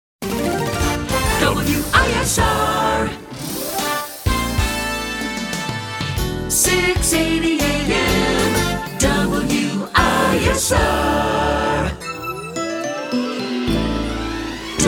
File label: Jingle; Short Attention Jingle Show